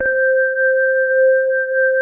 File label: DWGS SINE; Pro-Rec - Synthsonic